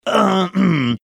making throat clearing sounds

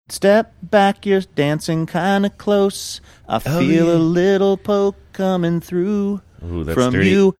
singing step back you're dancing kinda close

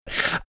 making death rattle sound

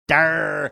durrrrrr

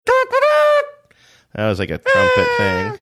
making fanfare party horn sounds

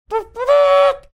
making trumpet sound

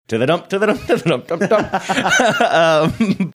laughing singing going to the dump song

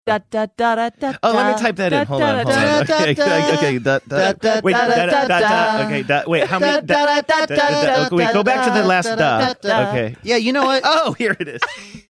singing melody

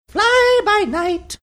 singing

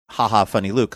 haha funny

